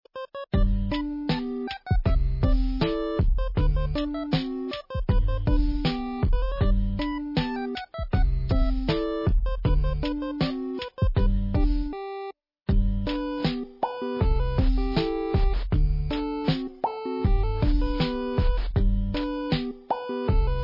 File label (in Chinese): 背景音乐